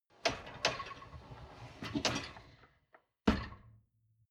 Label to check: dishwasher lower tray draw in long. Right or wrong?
Right